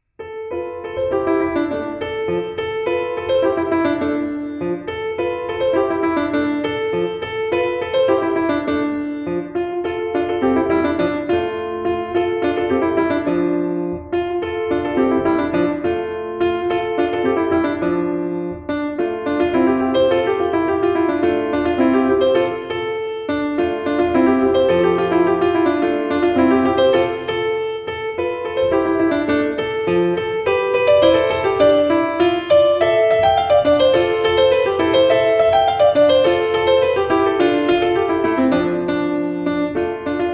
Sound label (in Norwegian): piano solo; 4. sats fra Syv slaatter og impresjoner for piano solo